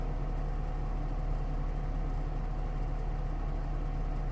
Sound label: heater loop; casualtiesunknown